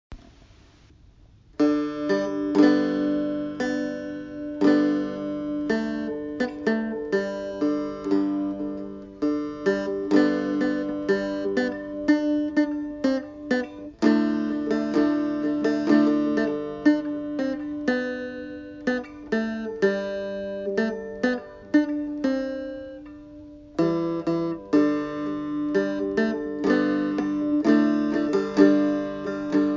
Resonator Guitar

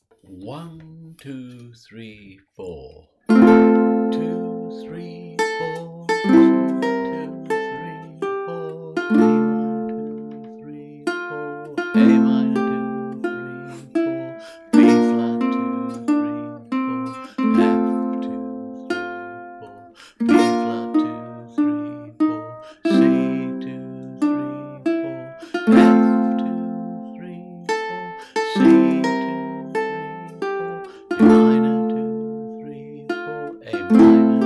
Pachelbel - C Harmony

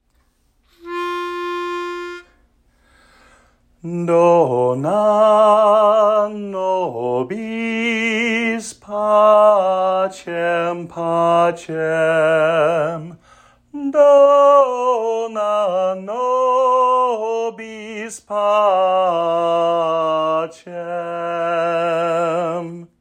Do Na Nobis (Lead)

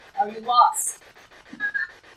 girl laughter

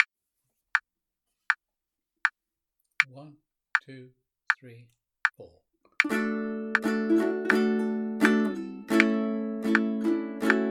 Strum; Suo Gan

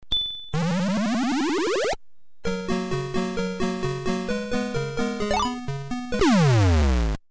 music; frogger

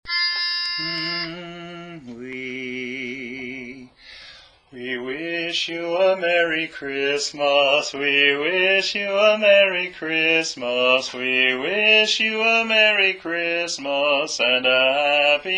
Bass; We Wish You A Merry Christmas

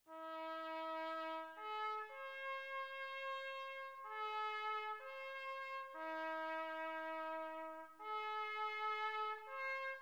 Church Call; Sounds of CGA

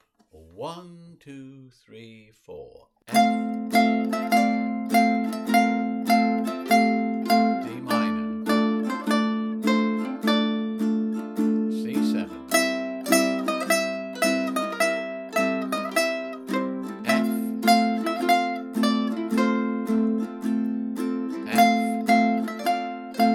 Harmony

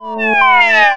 rampdown

